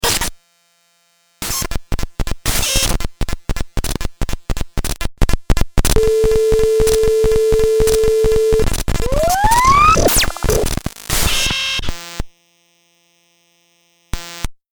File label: aaaaaaaaaaaaaaaa